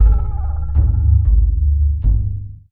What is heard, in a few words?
Electodjembe; Sonic Foundry (Sony Creative Software); Ma Ja Le Ethereal Textures